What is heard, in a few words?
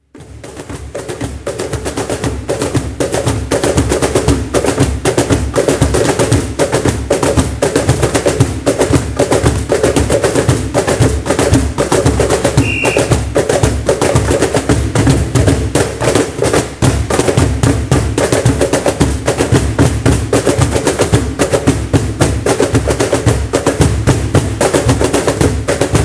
Samba Cajamba